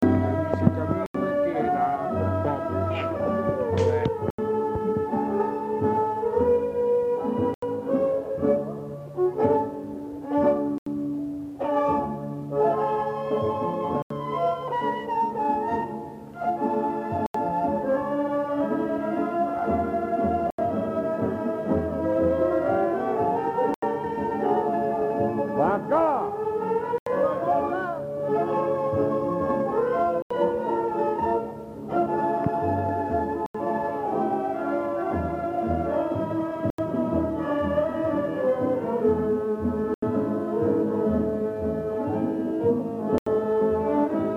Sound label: Tango